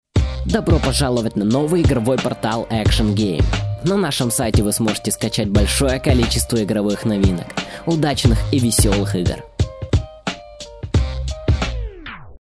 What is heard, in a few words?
action game